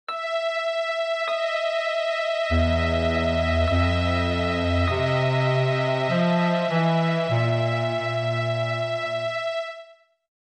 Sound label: Tense; 3D Movie Maker